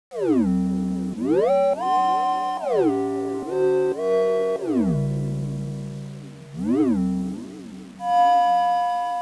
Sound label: glide